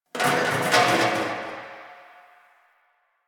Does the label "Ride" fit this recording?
No